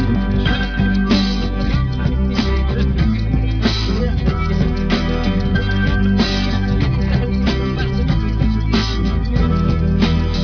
music; GRETSI05

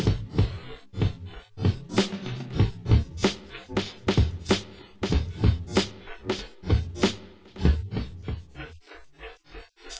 wdrums inst sim; SASSEC07

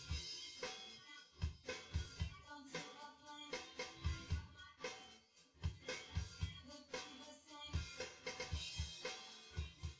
wdrums synthconv; SiSEC10; underdetermined

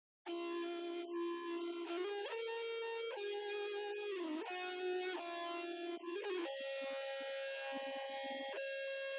nodrums inst sim; SiSEC08; SiSEC underdetermined

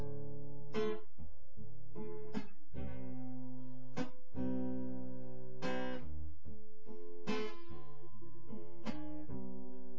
test no drums synth conv 250ms 1m sim; SiSEC10; underdetermined

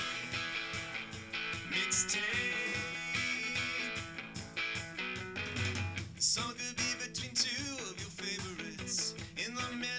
wdrums liverec 250ms 5cm sim

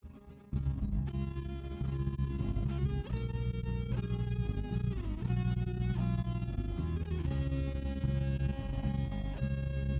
no drums inst; SASSEC07

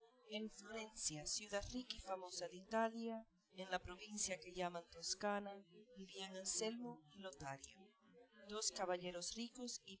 female inst sim; SASSEC07

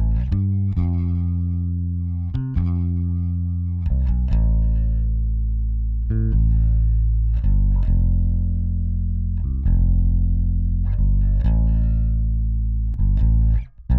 bass; SiSEC11

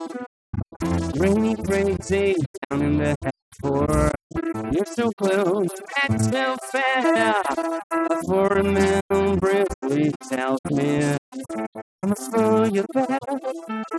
vocals; SiSEC11

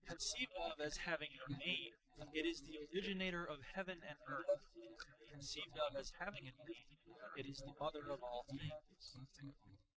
male inst src

